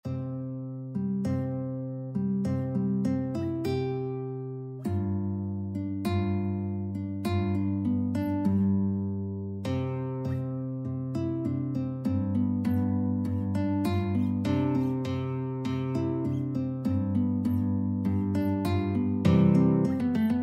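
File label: 2 guitars, guitar duet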